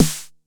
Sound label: Snare 909 Tune